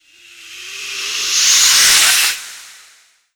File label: Dark Wind Suck Conjure